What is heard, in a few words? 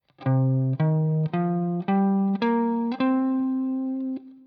C Minor Pent Example, guitar